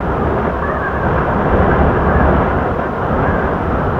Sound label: aura light breeze, ambience